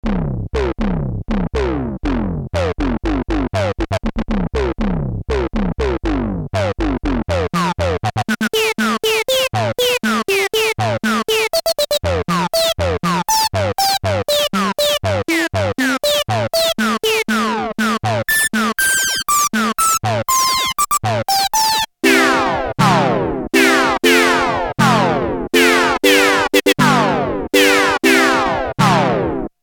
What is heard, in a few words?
yamaha tg77 filter demo lpf